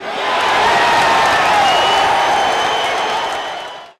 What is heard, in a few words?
Hockey Crowd Cheer; Crowds